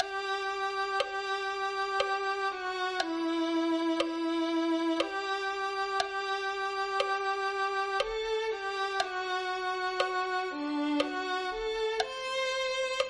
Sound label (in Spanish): violin